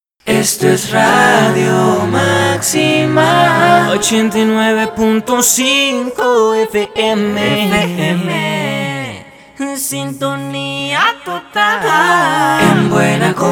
Jingle Pop Voces; Maxima

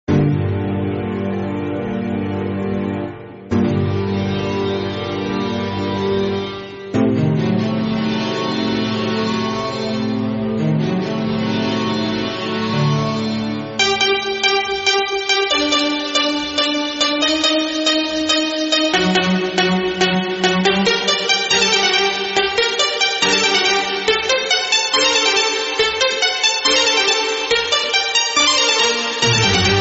Protesta Problematica Lamento Disgusto

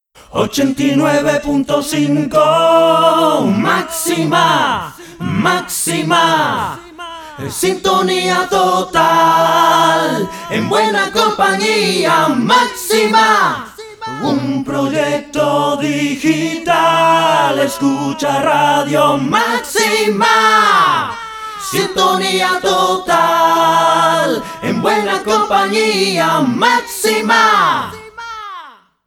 Pop Electro VOCES

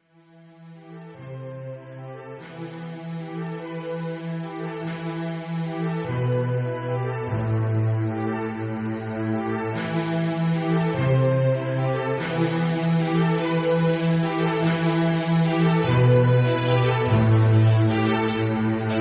Ciudad Alivio Marchante Multitud Melancolico

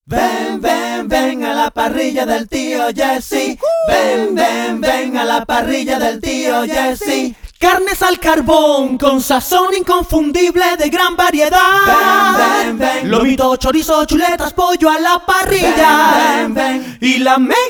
completa solo voces cantadas, jingle cantado a capela; La Parrilla del Tio Jessy

